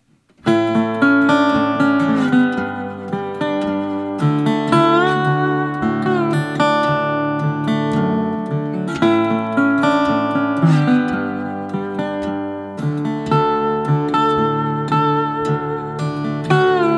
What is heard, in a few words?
Tri Wood Body